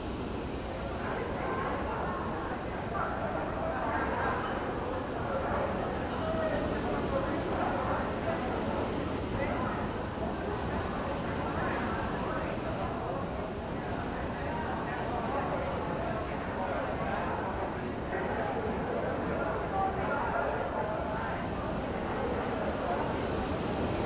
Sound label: Ambient Base